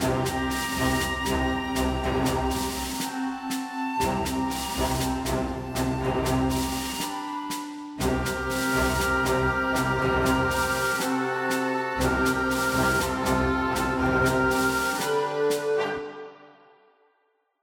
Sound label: Military Snare Soft; Tintin Reporter - Cigars of the Pharaoh